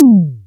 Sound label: ELECTR TOM, ELEC DRUM; USB Soundscan vol.20; Fresh Disco House I; AKAI